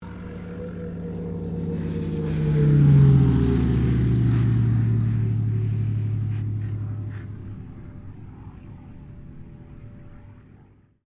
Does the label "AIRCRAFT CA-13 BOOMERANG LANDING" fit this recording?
Yes